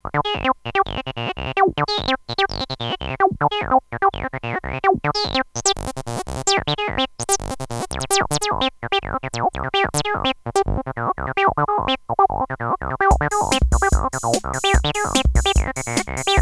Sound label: saw